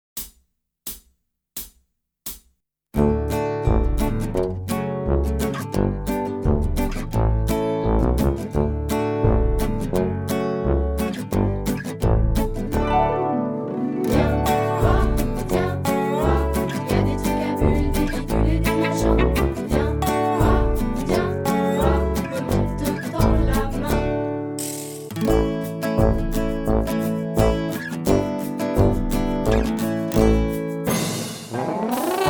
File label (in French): instrumentale Reggae